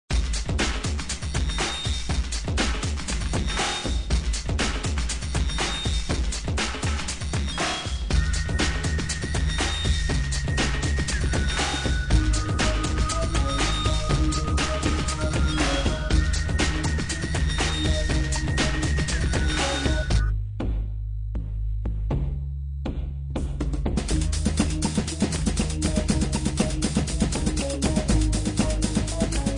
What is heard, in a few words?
Acid Box